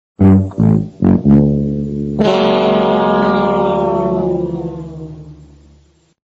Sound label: horn; reversevape